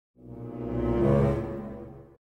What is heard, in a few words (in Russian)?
zvuki akuly